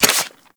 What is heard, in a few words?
Whip Equip, Weapons